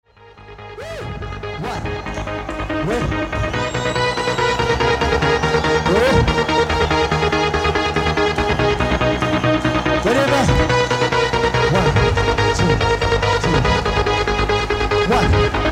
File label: Techno